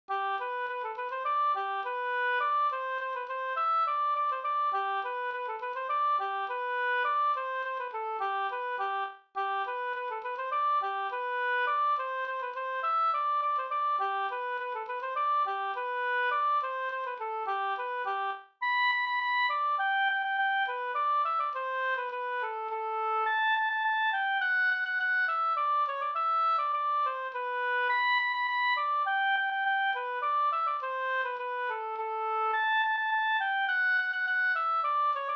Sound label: polka